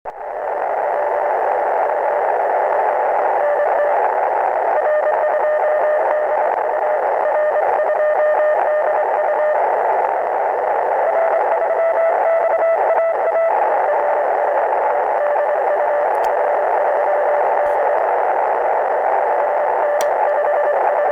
RX

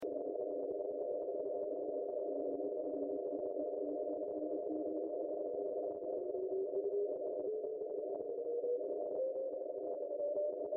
KX3 AGC Calibr

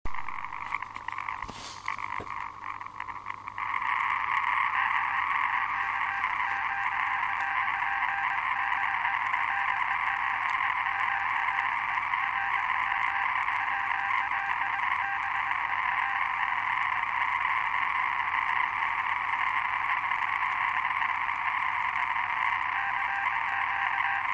RX

